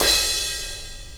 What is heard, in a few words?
crash